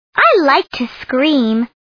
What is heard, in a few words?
scream; charley brown